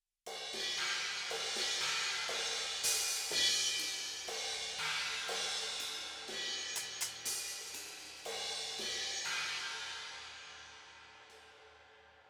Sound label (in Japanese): ドラム サウンド CYMBAL